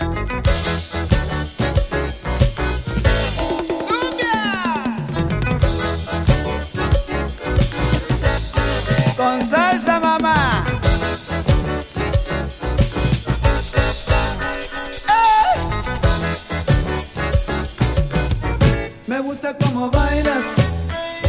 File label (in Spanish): CUMBIA CON SALSA, tex mex